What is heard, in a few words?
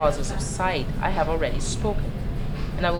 noisy